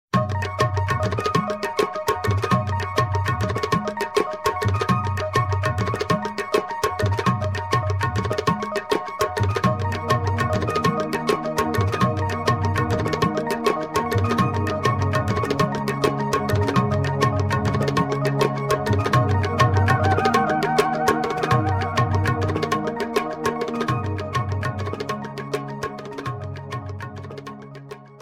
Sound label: Indian Tabla Sound